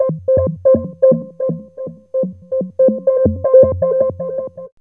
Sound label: ANALOG ECHO; Zero-G Ambient 1